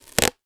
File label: sticky tape rip roll gentle; office; zapsplat